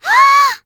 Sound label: Caramel Miss Sound; Jolly Battle; Android